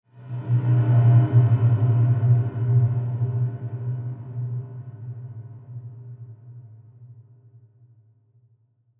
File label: Sweeper Dark Low Swell; Production Element Imaging Element Accent Transition